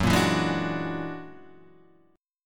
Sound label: F sharp Augmented 9th F sharp Guitar Half Step Down